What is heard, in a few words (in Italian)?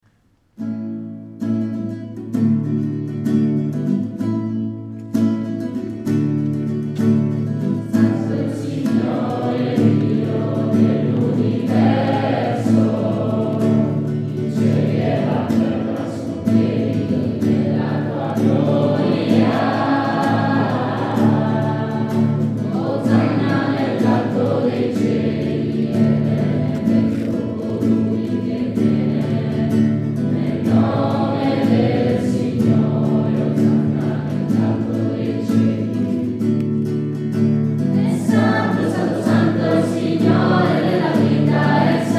santo francescano